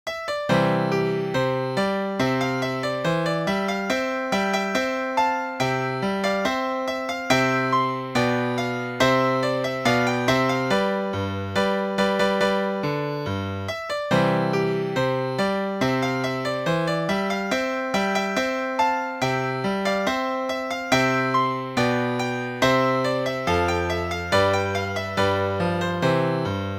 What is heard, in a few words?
Baroque Fanfare; Piano